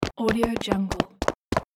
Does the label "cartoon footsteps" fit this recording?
Yes